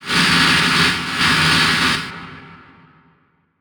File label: Transition Distortion Explosion; Production Element Title Transition